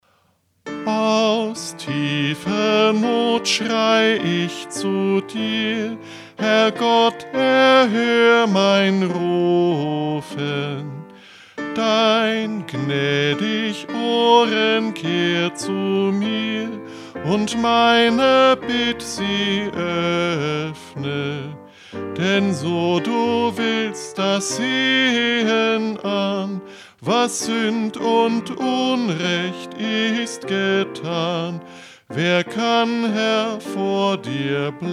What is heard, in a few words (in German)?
erste Melodie; EG 299; Aus tiefer Not schrei ich zu dir; patmos-gemeinde